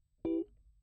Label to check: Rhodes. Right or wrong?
Right